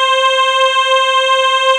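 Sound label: VOX C2, CHOIR; TOMVOX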